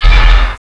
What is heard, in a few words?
stomp; endboss